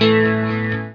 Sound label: alarm old